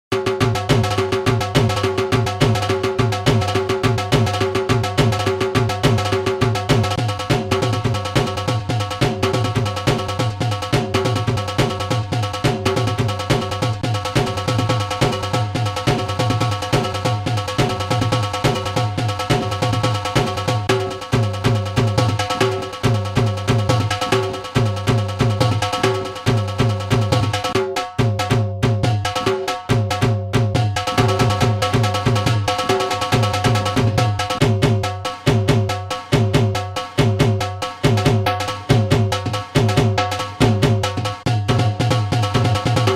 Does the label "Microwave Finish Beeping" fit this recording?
No